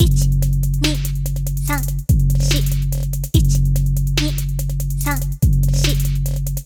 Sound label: 32 beat trap